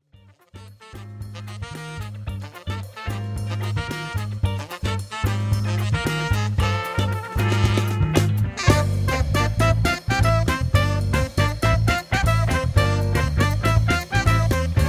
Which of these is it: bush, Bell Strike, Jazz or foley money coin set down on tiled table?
Jazz